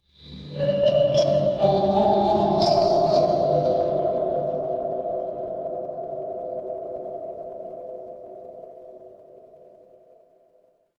horror ambience dark

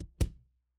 ui modal close

